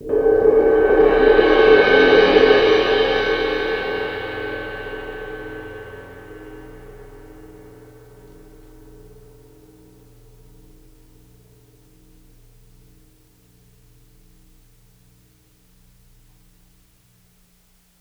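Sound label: c suspended cymbal soft with light crescendo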